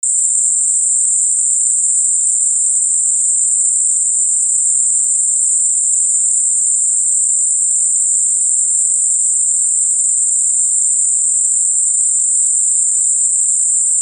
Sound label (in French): bruit rose